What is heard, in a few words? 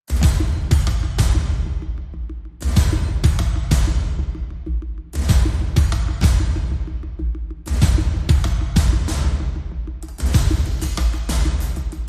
Drums Only